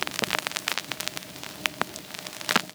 fx vinyl dirt

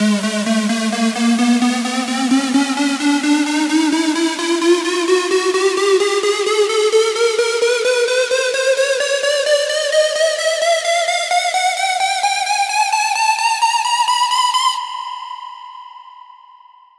Square Ab Pulse

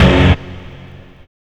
effect stab, Effects & Transitions

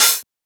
Open Hat; FLPRO

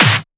SnareClap; FLPRO

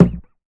Perc, Percussionz

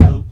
Kick

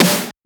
Snare; FLPRO; Snares

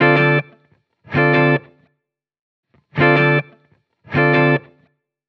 Electric Chord Loop, 160 BPM D Min; Cymatics

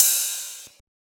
Ride; FLPRO

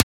Transient; FLPRO

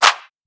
Shifted Clap; FLPRO

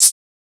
Shaker

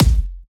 Kick, Kicks; FLPRO

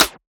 Percussion; FLPRO

